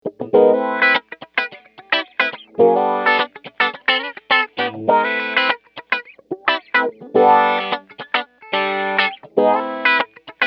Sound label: filter wah; mobius